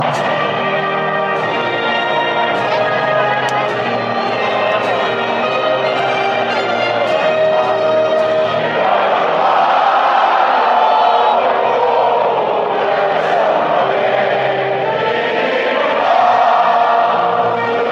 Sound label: portugal national anthem, fanchants